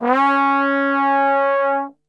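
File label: C#3 trombone gliss, Brass; Best Service; Brass Super Section; TRMB GLISS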